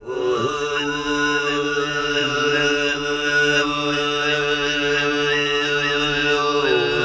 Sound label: GRP, TUVAN GROUP; Spectrasonics Vocal Planet CD5; World Voices